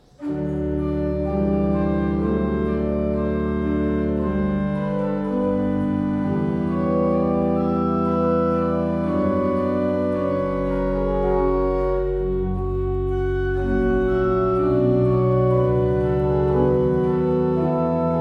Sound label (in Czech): Principal 8; Vsemina